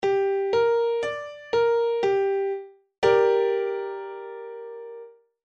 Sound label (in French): degré V m2